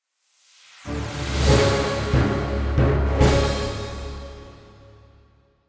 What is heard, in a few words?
Battle End; mass effect 2; Starport